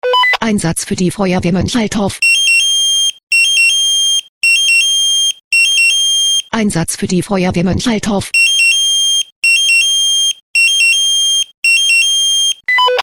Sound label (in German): SMS Alarmierungstone; FW Moenchaltorf